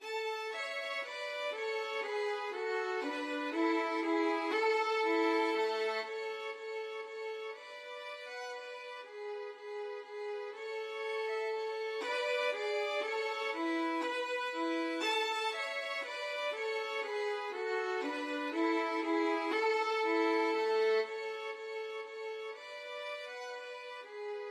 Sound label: Menuett 1 violin duet